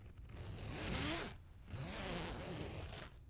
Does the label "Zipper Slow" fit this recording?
Yes